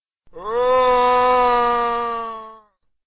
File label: zombie Hoouw